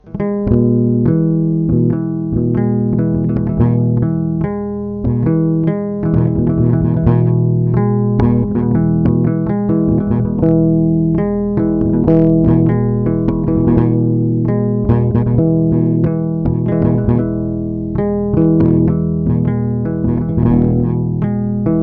Bass line

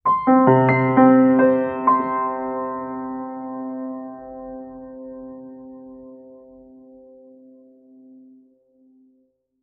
piano

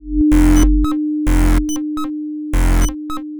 sine tweaking

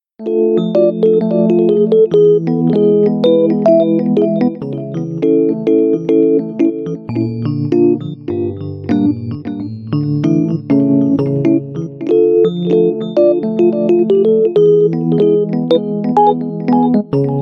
Rhodes

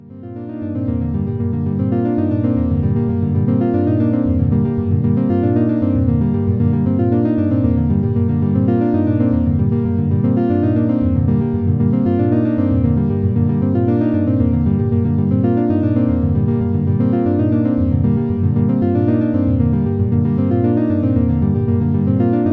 piano; librepiano

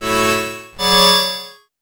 bandoneon snippet fast

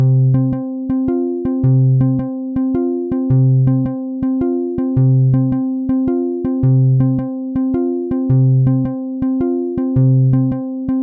acoustic bass supercollider

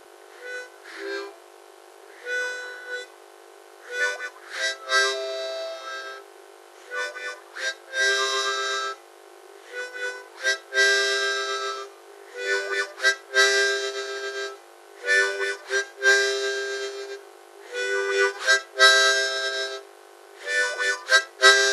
harmonica

